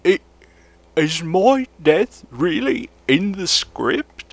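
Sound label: dying; NWNDS; nigel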